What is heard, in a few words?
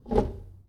Foosball Center Rotate